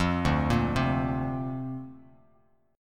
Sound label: D-Minor 7th Sharp 5th D Bass Standard, chord; Dm7#5 arpeggiated; JGuitar